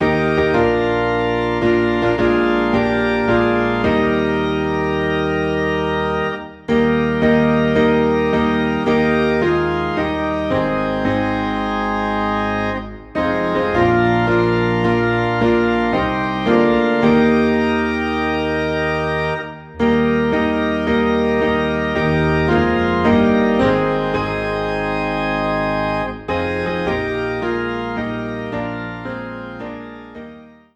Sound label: Piano and Organ in E Flat